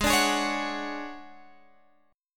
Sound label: D Major 7th Flat 5th, chord